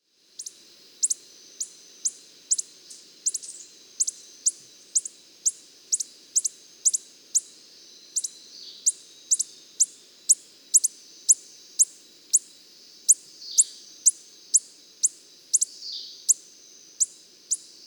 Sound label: Coppery headed emerald